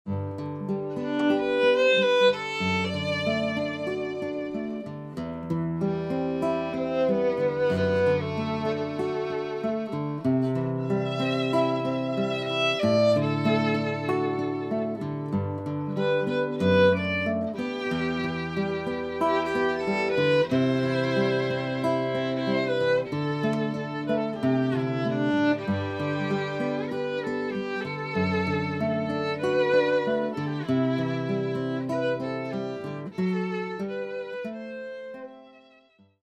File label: violin